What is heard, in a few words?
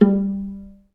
Vla Piz G2; Digital Sound Factory Virtuoso